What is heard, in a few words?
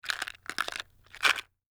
Raisins Box Shaking Short, Household